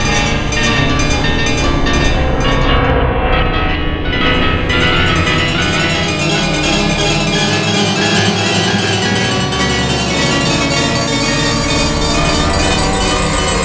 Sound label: RADIATOR, Disjointed Textures; USB Soundscan vol.33; AKAI